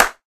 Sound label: taunt hard clap; video games; source engine; tf